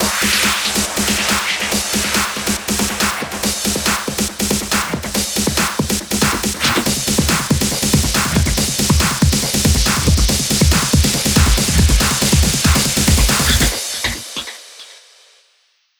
140BPM 8Bars, HandsUp & Trance Fills; BluDay; Vengeance Samples

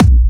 Bassdrums Tekhouse; Kicks; Vengeance Samples

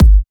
Bassdrums; Vengeance Samples

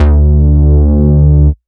Bass D, Root D; Vengeance Samples; Vengeance Ultimate Bass

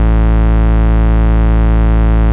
Bass Root A

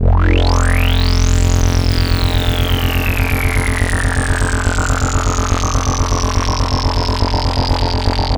Roland C F1, Roland S100; musicradar-synth-samples

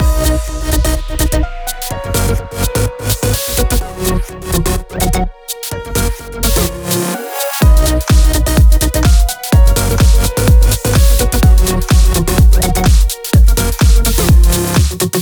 126BPM Netherworld Mix; Vengeance Samples

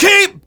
Vocal Oneshots; Vengeance Samples; Vocals

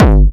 BD Distortion, Bassdrums; Vengeance Samples

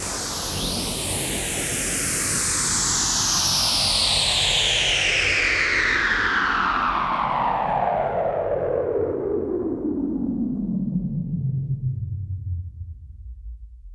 Downlifter

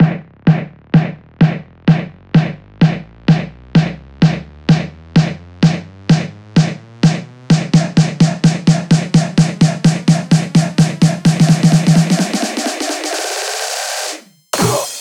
128BPM Header, House Electro Fills; 8Bars; Vengeance Samples

